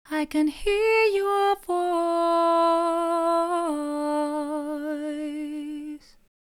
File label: Vocal Dry